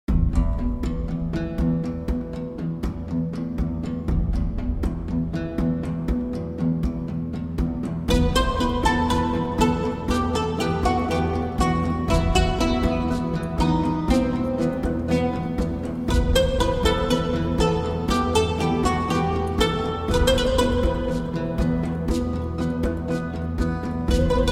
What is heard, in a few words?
Oriental Arabian Oud